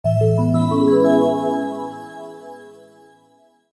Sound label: Wii download assistant sound